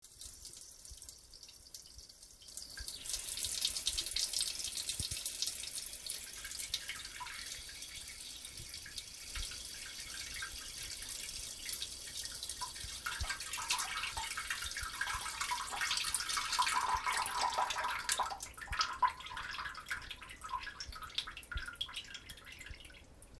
ぱちゃばちゃおしっこ

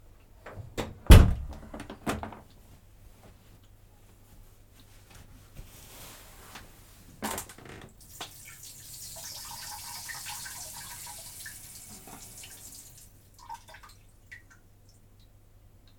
放屁放尿脱糞音声集(きばっている音も含めたロングバージョン)

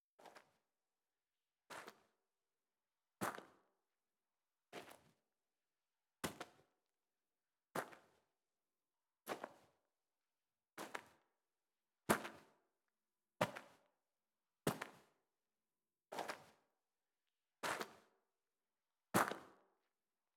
海軍秘密基地見学(洞窟内、魚雷のスクリューを回す音、コウモリの飛ぶ音、鳴き声), ASMR

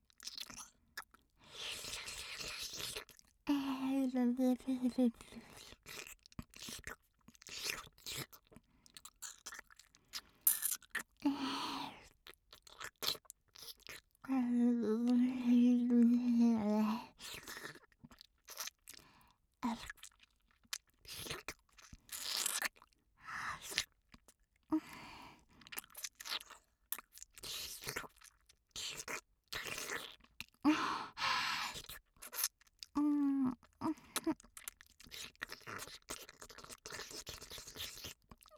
ねっとりまったりフェラチオ; 天国

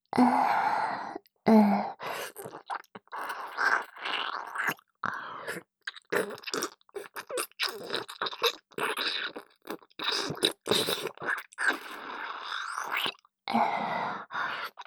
舌にフェラをするような感じ。水気多め, キス; おまけBGV

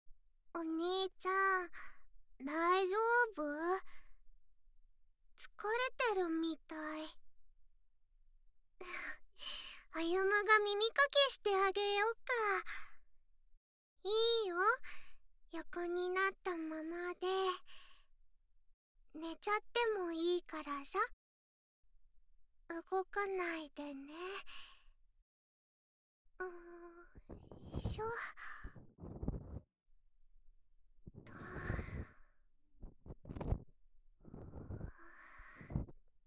耳かき, ASMR; あゆむちゃんの耳かき

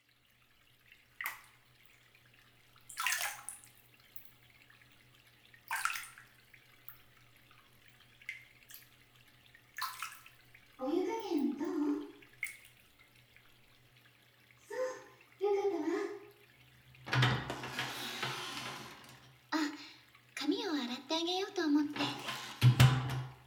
シャンプー ＆ ヘッドスパ

